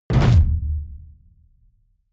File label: sci-fi catapult fire ball big